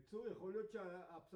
Piano A

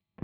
Guitar

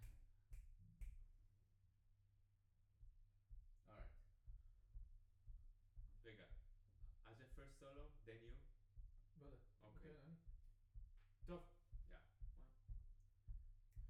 Piano B

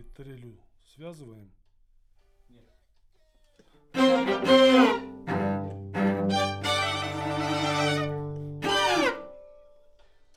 Alt, piano

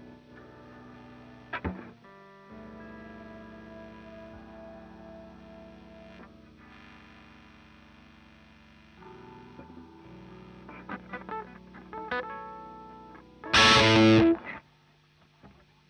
Gtr; Marshal

